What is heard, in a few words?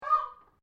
Bird Peacock Call; Peacocks in Victoria and South Australia; Birds (Sound Effects:Animals)